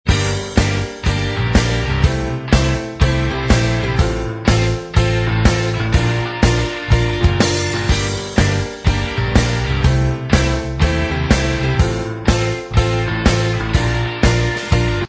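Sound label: Instrumental Track, backing tracks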